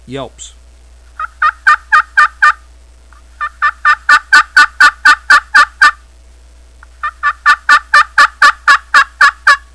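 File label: rosewood striker yelps, turkey call; millennium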